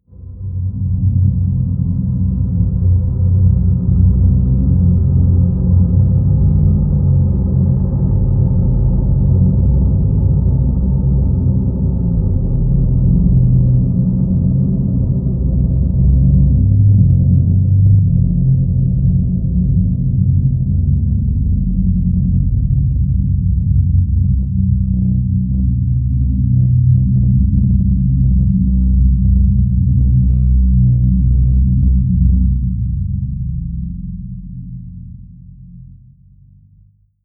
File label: drone moan